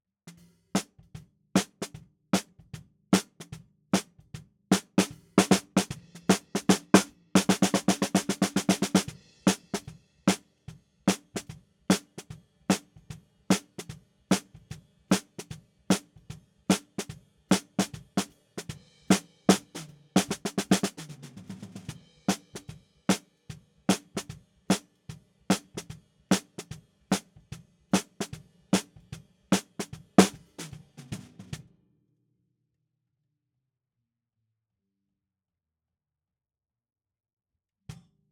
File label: SNR BTM